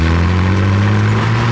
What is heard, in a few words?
tank start; Rabbit-Wars